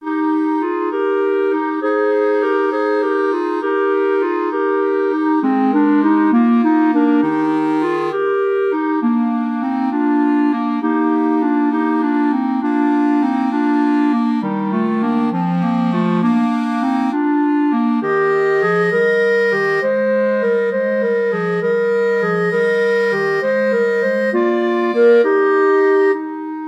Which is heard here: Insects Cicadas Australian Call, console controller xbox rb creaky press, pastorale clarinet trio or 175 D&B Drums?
pastorale clarinet trio